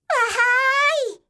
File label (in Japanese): wahaai, yorokobu